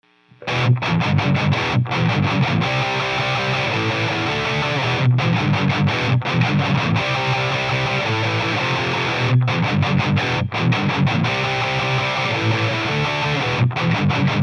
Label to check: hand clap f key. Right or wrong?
Wrong